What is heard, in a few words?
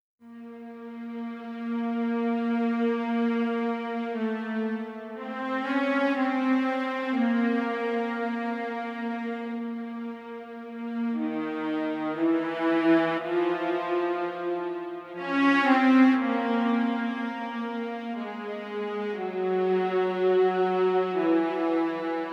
Viola Completed; オーケストラサウンド